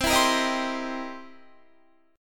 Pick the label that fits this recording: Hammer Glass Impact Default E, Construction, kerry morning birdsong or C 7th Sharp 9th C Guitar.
C 7th Sharp 9th C Guitar